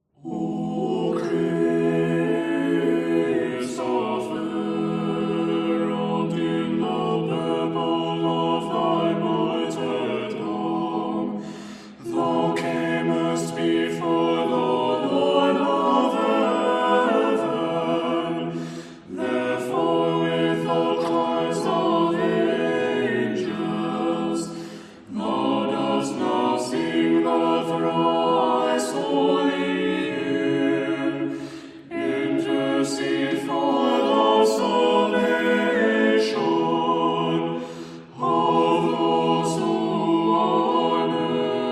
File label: Russian Greek Chant, Tone 4; Troparion to St. Christopher